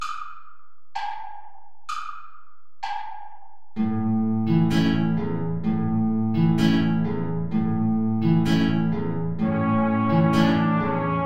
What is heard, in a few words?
tango play along without trumpet; albeniz isaac